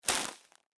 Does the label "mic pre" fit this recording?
No